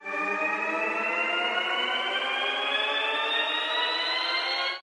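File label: respin sound; longpao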